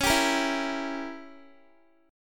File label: Db Diminished 7th Db down Guitar; strummed